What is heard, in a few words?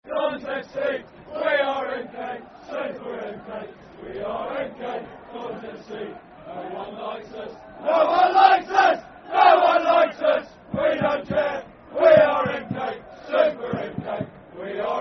Football Chant (Soccer Song); No One Likes Us; Milton Keynes Dons Mkdfc